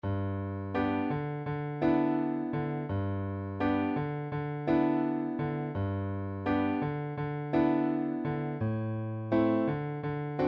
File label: piano; 茶摘み